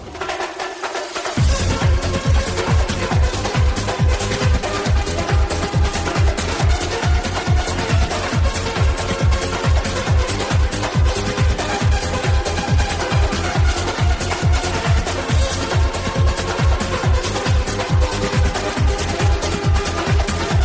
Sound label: bongo song